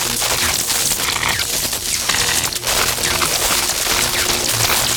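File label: wires spark loop; Explosions; modern warfare 2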